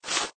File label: sandpaper scrape long